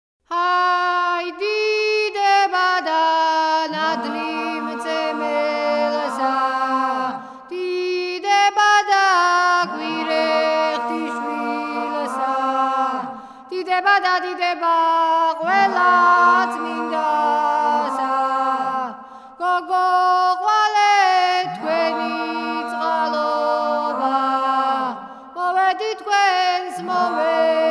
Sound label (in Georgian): ferxisa moxeuri saferxulo; Mzetamze